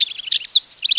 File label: bird twitter; animals